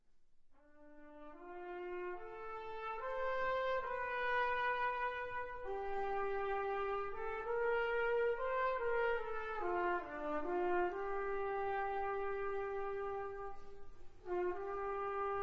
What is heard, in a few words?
orchestral game concert